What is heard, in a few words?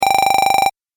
Retro Game Repeating Sound